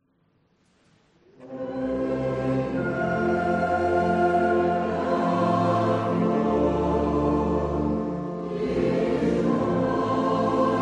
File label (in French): Choeur Pelerins; Wagner